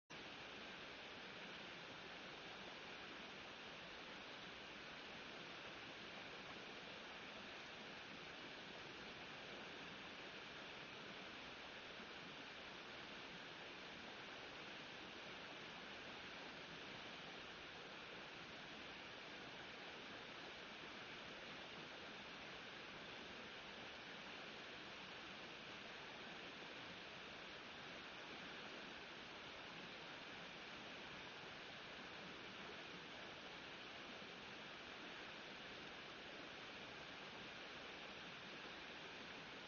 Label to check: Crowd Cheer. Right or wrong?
Wrong